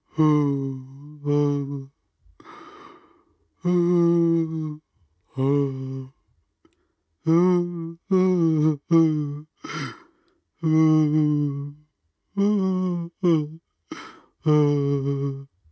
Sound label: Maggot Pray Loop